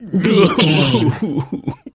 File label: voice male cry